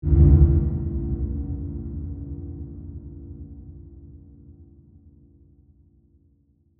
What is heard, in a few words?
Horror Whoosh, Airy, Ethereal; Scary Tone Sound Effects